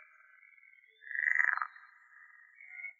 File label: Pool-Frog, frogs